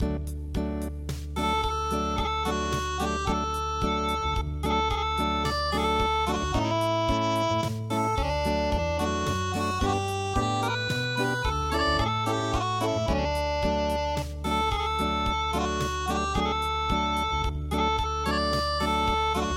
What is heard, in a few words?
Unplugged